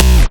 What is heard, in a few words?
Basses Root C